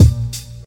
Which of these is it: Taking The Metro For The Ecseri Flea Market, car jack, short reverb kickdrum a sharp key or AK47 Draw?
short reverb kickdrum a sharp key